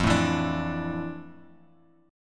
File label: F Major 13th Bass Standard; JGuitar